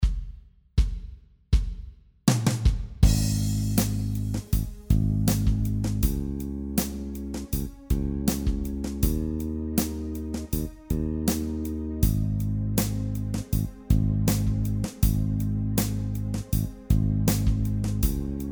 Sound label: Ab Groove Jam Track